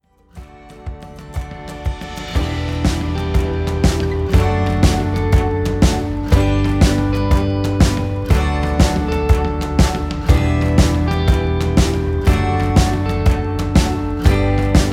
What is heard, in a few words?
Instrumental